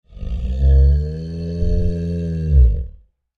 Creature Large Moan Rumble Gutteral; Monsters; Sound Effects:Horror; Big Room Sound

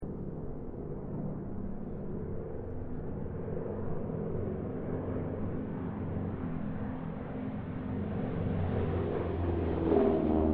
AIRCRAFT HARVARD SQUADRON 4 LOOP; Mixed aircraft squadrons; Airplanes; Vehicles

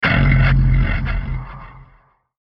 Hit Grind Delay Filter; Sound Effects:Sound Design; Blastwave

